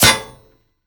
bullet metal solid, physics

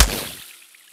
underwater impact bullet; physics; surfaces

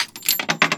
coin slot, office; Counter Strike Model Pack; CS:S

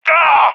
death; Counter Strike; CS:GO; leet epic

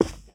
carpet, footsteps; player

